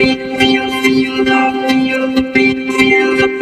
Feel The Beat; Синтезированный голос (Техно-транс); Techno Trance; vocoder; Вокал; вокодер

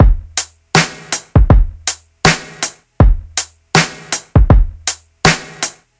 Verb Snare, beat; Ударные и барабаны (Downbeat); 80 BPM; Downtempo; Drums; даунбит

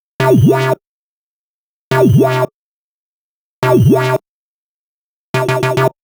Jungle, dubstep

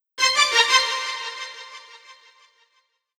70s Strings

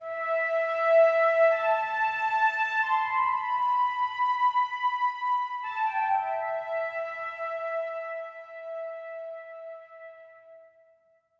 Flute, movie score; Сэмпл флейты (Movie Score); film music; woodwind; духовые инструменты; Flute A; 80BPM

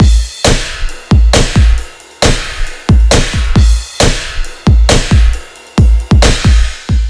Dome Kit; Dubstep

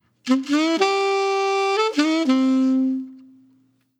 Bluesy, jazz

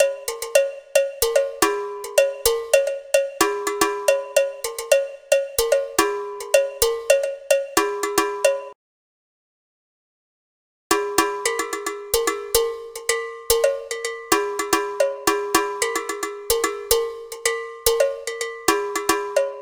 African Cowbell 110 BPM; коровий колокольчик

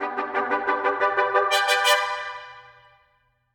Horn Bit

